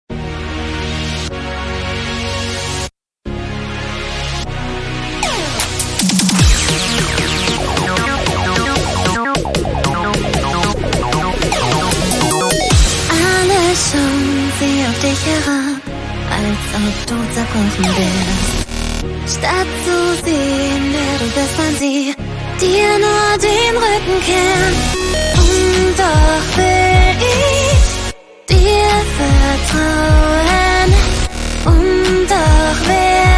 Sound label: German ver.; Beatless